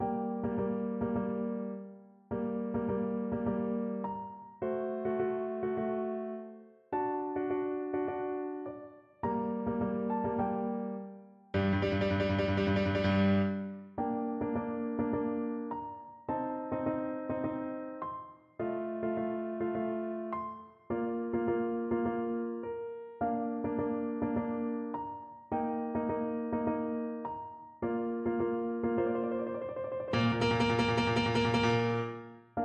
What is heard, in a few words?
piano